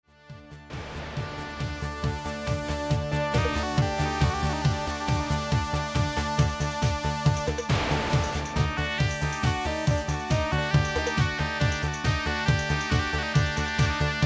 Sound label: Arabic